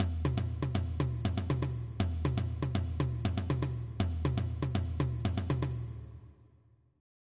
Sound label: soukous; Shifted Traditional Rhythm Pairs; Soukous and its right shift by 2; Creating Rhythms